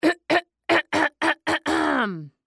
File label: Clear Throat